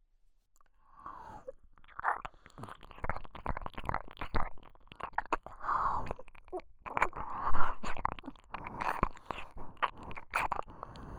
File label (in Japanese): 耳舐め, ASMR; SE無し